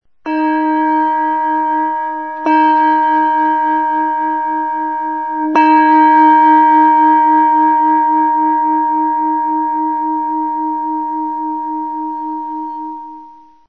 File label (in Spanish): CAMPANADAS; Sonidos/instrumentos